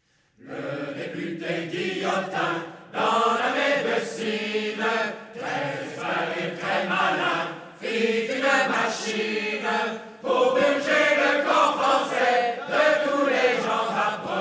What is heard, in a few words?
Choir; Assassin's Creed Unity